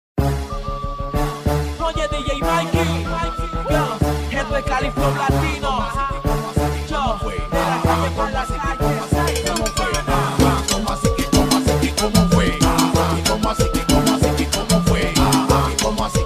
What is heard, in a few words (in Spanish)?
musica